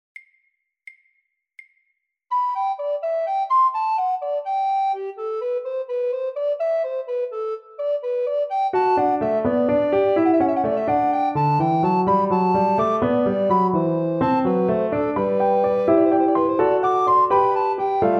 Recorder; Murril

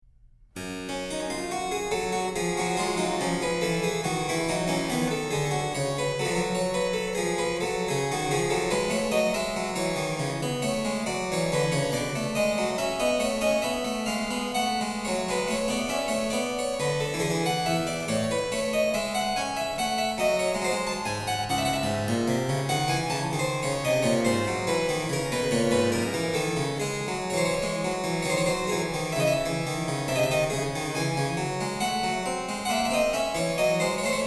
Invention Harpsichord; Bach

